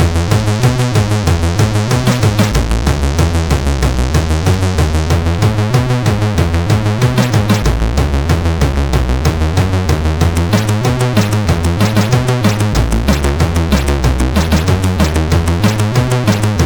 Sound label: Rock N Roll, sid CSG8580R5